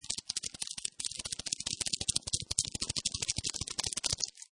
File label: Creepy Crawly Critters Crawling, Creepy crawly critters patter and scatter; Spider or tiny creature, pattering and scattering, digging and scurrying